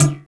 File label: PERC, Drum & Bass, 170 BPM; Ueberschall; Drum & Bass Flash Trackz